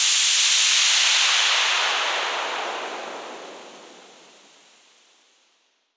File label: Crash, Cymbals; Vengeance Samples